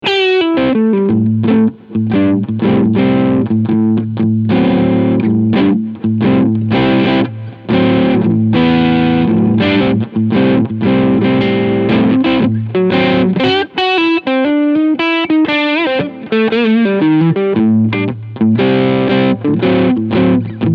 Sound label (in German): Ibanez AZS2200F beide PUs Crunch